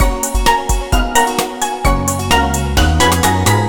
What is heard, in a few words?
upbeat; Toki Tori; forestfalls; Toki Tori Collection